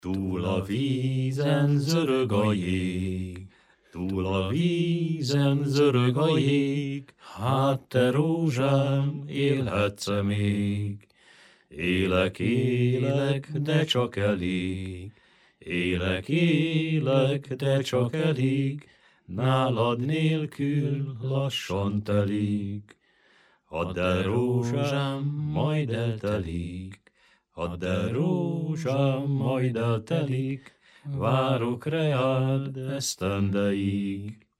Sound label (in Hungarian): nepdal; tul a vizen zorog a jeg; nepdalok